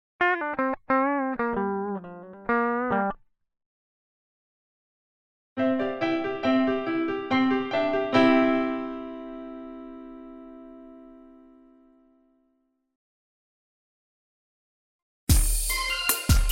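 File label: three sets of short music electric guitar for piano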